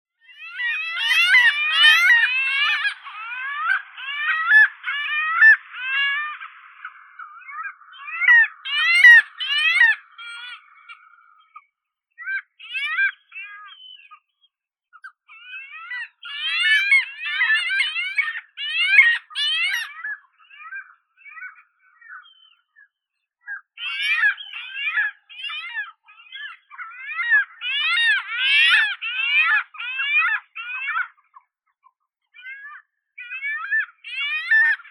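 arctic skua Stercorarius parasiticus, nature sounds; pomornik korotkohvostii; zvuki prirodi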